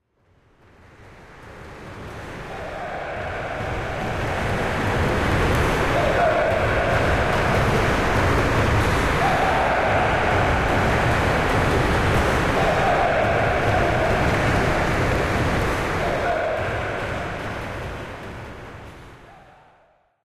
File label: crowd Chant France, football